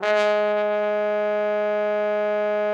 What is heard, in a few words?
TROMBONE, SECTION LOOP